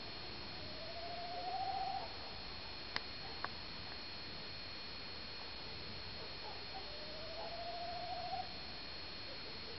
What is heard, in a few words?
Tawny Owl - warbling 2 times; Vugrovec